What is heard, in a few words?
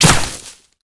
ground hit, damage; DCS